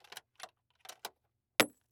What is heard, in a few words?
Weapon Bullet Shell 270 Rifle Eject Shells On Dirt; AdobeCC